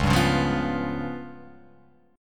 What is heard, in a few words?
C sharp 7th Flat 5th C sharp down Guitar Open F; C#7b5; strummed; JGuitar